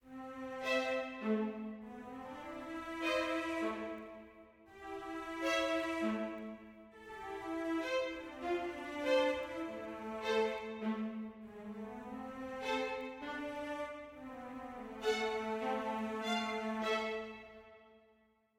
mysterious med

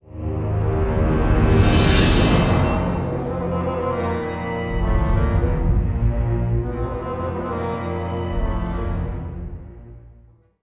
Tension; Star Wars Obi-Wan

